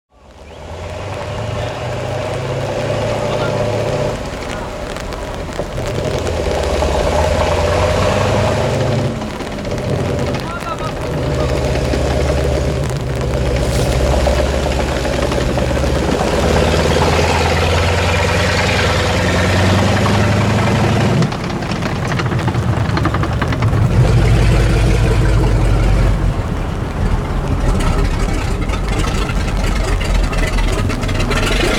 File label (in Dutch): Sherman Tank Motor Geluid